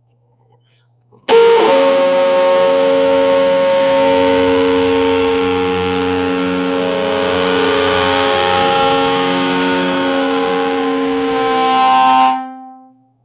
the sound of a lute

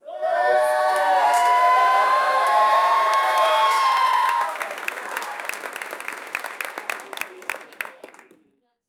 cheering crowd small appl, applause

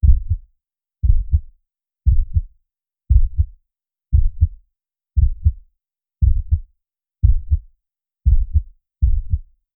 heartbeat dhak dhak tez

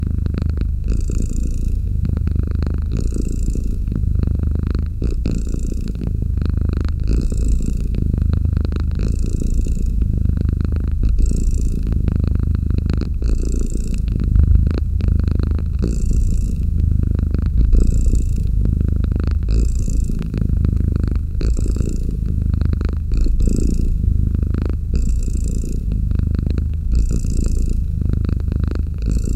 soft calm cat purring; feline; soft calm cat purring wit

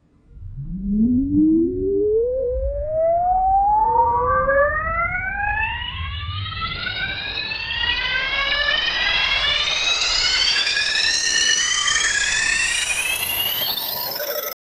sonar sound speeding up; navigation

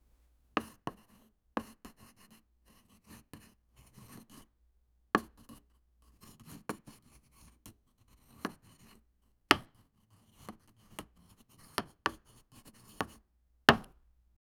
slicing something on the

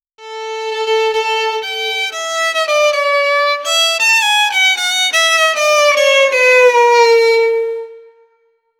one violin transition of

